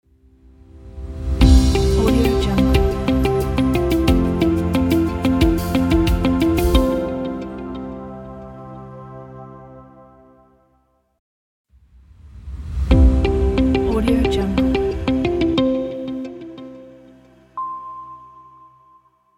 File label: business logo